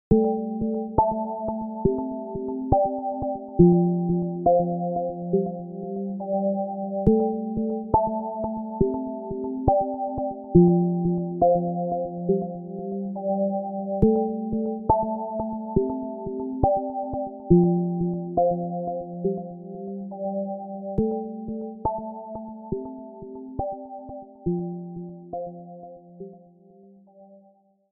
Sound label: moog